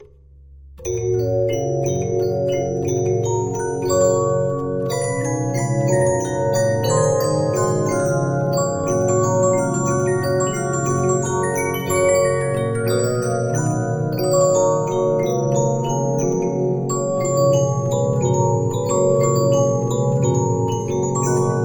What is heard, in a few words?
Regina, 15inch disc musicbox